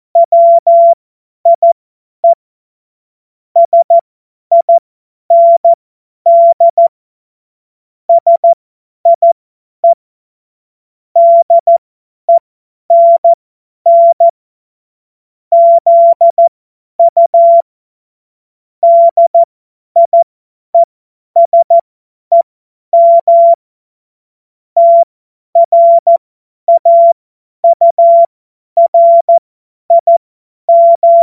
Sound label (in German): Witz, Morsekurs, cw morsen